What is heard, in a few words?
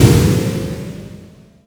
jump